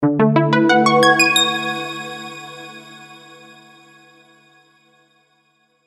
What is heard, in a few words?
scales HI